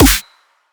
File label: snare; 7rystan's Snare Pack 2018